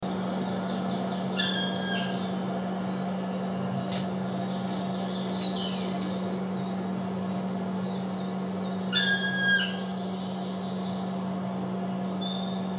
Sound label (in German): schwarzspecht; Mag. Peter Holub